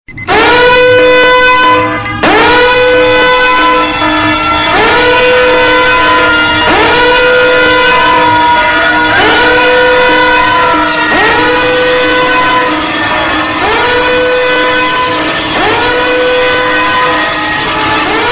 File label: loud ringtone; android